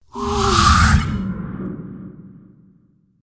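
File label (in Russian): волшебный свистящий переходный звук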